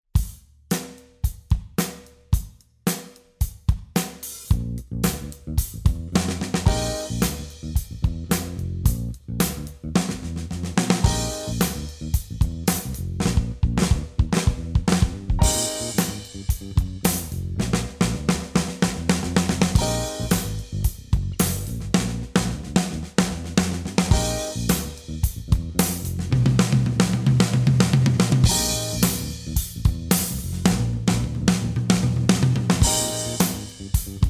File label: Pop, Drum Fills; Essential Drum Fills; Erskine